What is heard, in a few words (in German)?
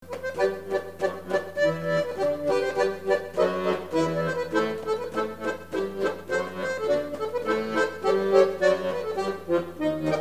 Ambos-Polka; Alleinunterhalter Musik